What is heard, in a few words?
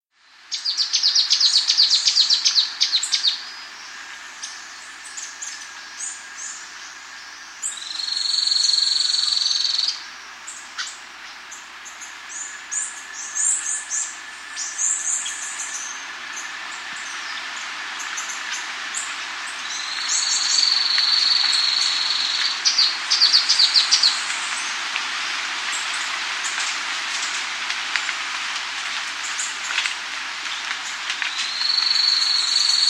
Orange-Bellied Antwren; S. America